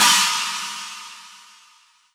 Korg N1R Chinese Cymbal

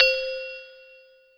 Kawai K5000W Glocken C5

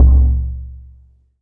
Yamaha V50 Soft Timpani

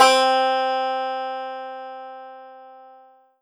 Roland SC-88 Banjo C4

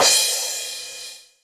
Crash Cymbal

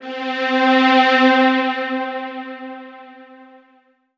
Korg 01W Sad Strings C4

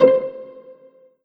Kawai K5000W Pizzicto C5

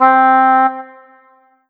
Kawai K5000W Bassoon C4

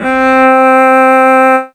Casio CTK 611 Viola C4

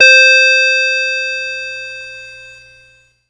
Yamaha PSS-140 Bar Chimes C5

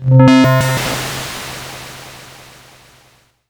Yamaha PSS 170 Fireworks C3

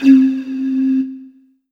Alesis S4 Plus Calliope C4

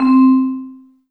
Korg M3R Room Vibes C4

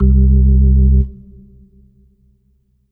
Ensoniq SQ-1 Jazzys Organ C2

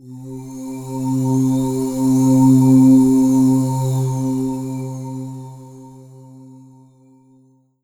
Alesis S4 Plus Night Vox C3

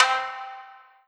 Yamaha TG77 Shami C4

